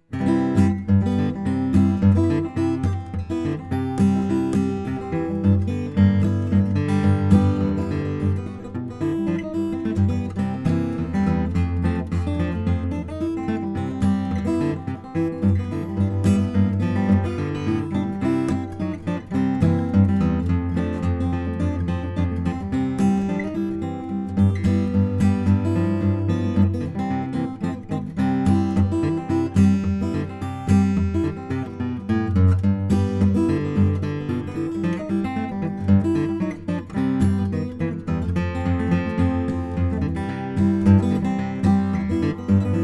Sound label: jump blues